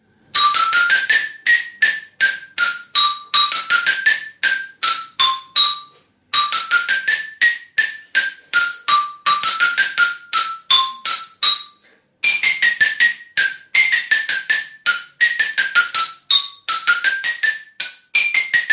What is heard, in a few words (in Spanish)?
xilófono